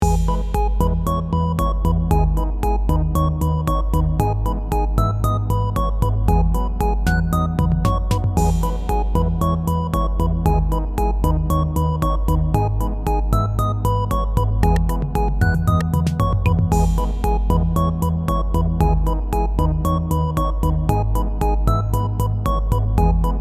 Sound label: game music loop